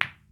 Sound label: hit, billiards; PoolMod